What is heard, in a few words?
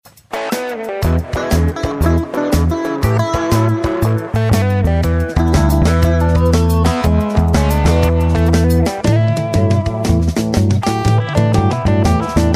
Blues, Instrumental; Triloka Music